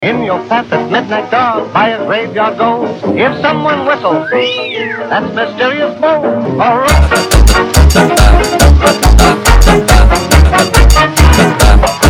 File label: funk